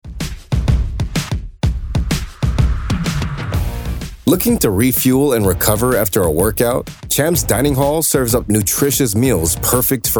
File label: Jazz